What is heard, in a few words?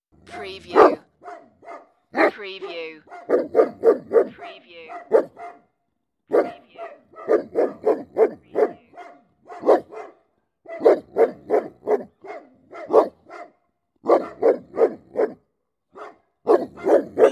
Big Dog Barking